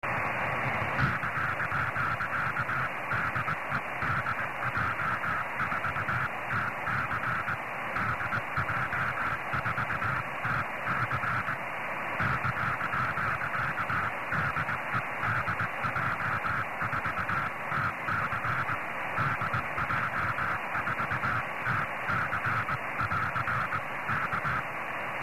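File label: CW cq aurora, 144 MHz; DJ4TC JO63PN 389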